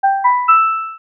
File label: order ready; kitchen; kiosk